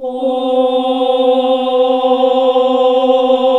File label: OHH C2, CLASSIC VOX6